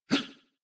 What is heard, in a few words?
Pain, en; Warframe; New War; Teshin